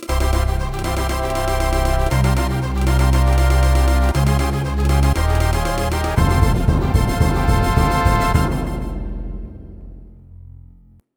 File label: Fanfare; Rare Fanfare; Donkey Kong Country 2- Diddy's Kong Quest